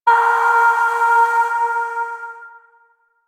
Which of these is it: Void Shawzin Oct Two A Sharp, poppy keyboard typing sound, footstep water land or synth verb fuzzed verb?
Void Shawzin Oct Two A Sharp